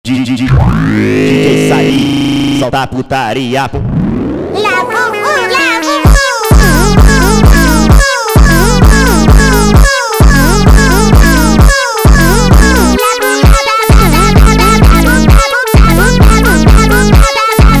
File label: Funk